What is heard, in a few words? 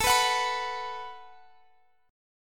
Gb Minor Double Flat 5th